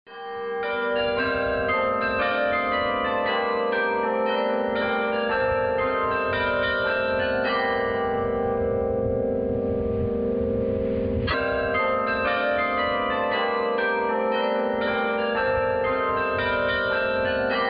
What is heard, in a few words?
Carillon